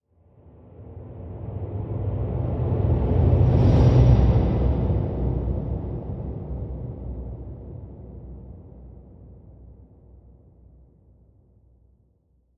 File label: Low Swoosh